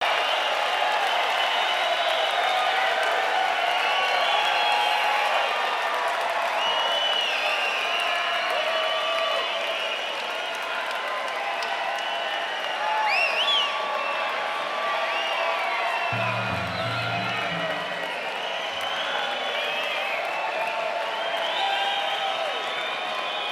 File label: Crowd noise